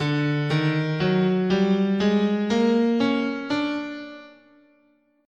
D Persian, guitar, scale

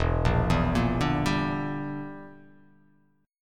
E Minor 7th Flat 5th E down

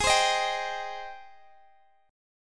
G sharp Major 7th G sharp, Guitar Drop D

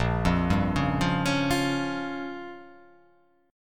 A sharp Minor 6th A sharp down, chord

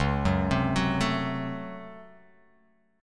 Db Major 7th Suspended 4th Sharp 5th Db; 9,9,10,11,9,x,x,x; DbM7sus4#5 arpeggiated; JGuitar

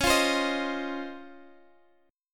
C sharp Major 9th C sharp, Guitar Drop D; x,x,11,10,13,11

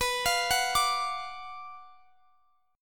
Gb Major 7th Suspended 4th Sharp 5th

